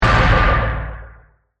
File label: Metallic Low, Production, Element, Metallic, Low; Warped Sound Effects; Sound Design